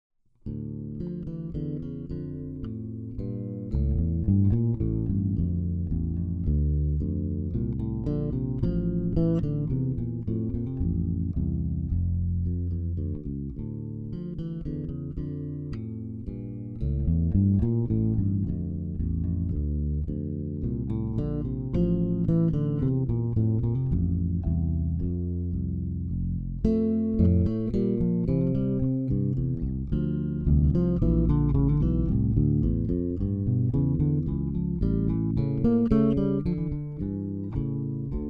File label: Duet Bass